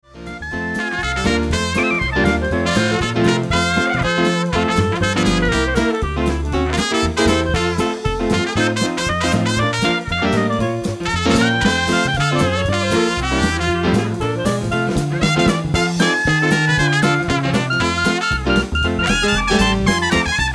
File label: saints go marching in, dixieland